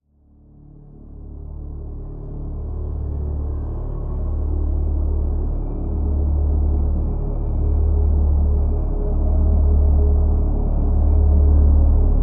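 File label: Drone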